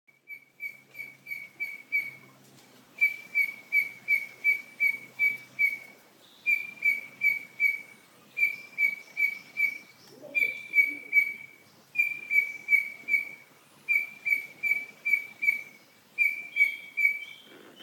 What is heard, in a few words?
Heteromyias Cinereifrons; Grey-Headed Robin; Call 2